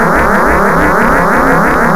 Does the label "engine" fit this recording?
Yes